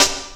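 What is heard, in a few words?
snare drum, Drum & Bass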